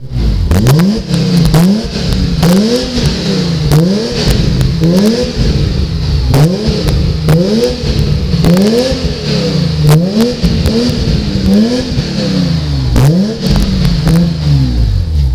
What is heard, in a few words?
BMW E30 M3 E36